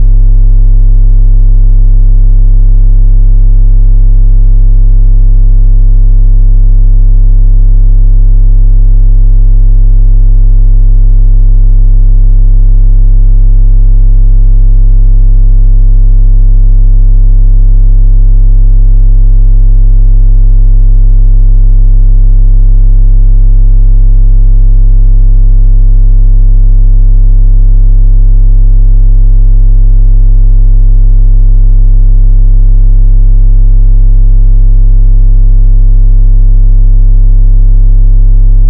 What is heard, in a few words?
Device Test Frequency